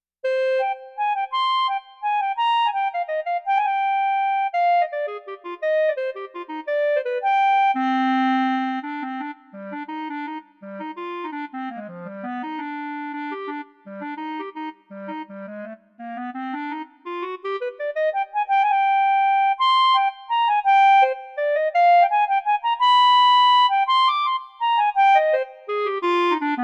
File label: Bb Clarinet Technical